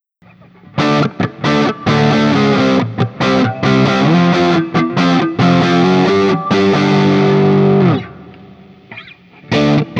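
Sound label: JCM800, guitar; Guild NS X350 Stratford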